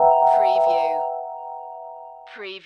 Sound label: Hip Hop Dark Rhodes Licks 90 BPM Swing - E